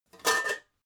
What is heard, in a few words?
pots throw soft